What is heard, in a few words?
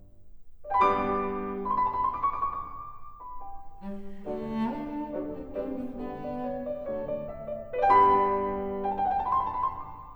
Variations for cello & piano in G major